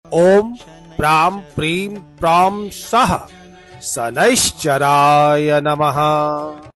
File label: shani mantra; mantras